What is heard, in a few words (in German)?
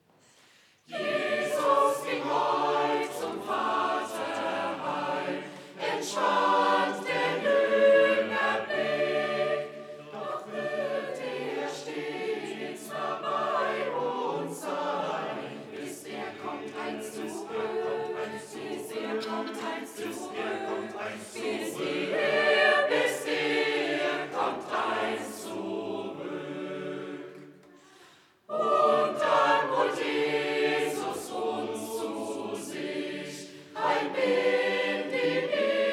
Chor